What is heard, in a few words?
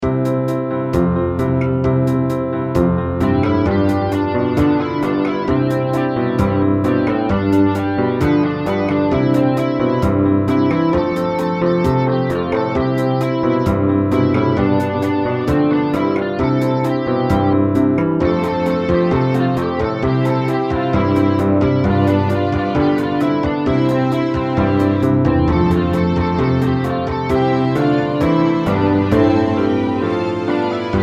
Pop